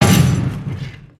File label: Impact; Anchors Away